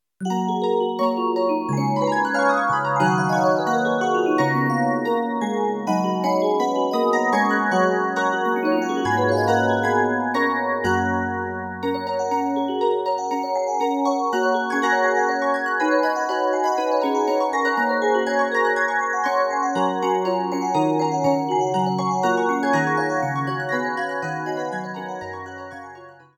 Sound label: Jingle